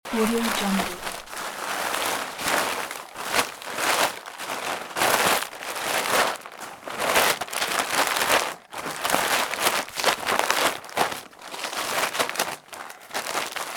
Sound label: paper smashing loop